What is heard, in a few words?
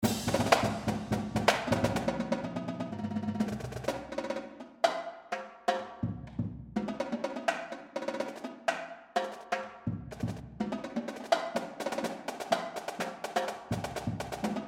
drumline cadence; edgewood